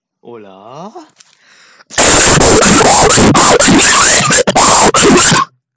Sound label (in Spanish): tos saturada